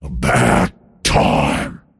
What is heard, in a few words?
cast pain; dota; pudge